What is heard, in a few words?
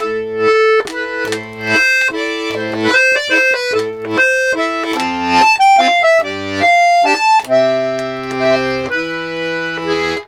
140, Complete Accordions; USB Soundscan vol.40; AKAI